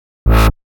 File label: 125 G Bass, Complex Electro Synths; Prime Loops; Explorations